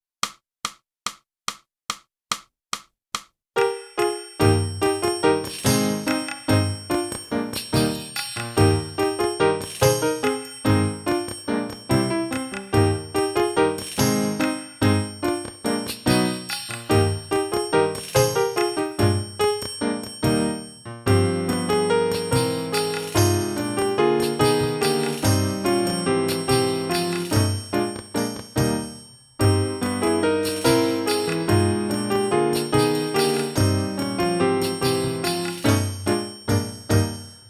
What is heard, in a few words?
clarinet; Boosey Woodwind Method: Clarinet (Book 2)